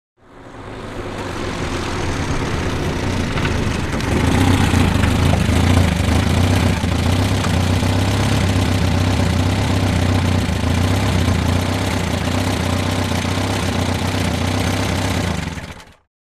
Automobile; In / Stop / Off; Austin Ruby Up, Stop, Idling And Switches Off.